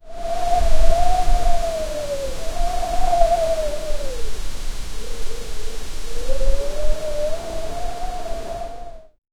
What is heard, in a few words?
wind through trees, forest